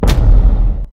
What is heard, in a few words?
monster walk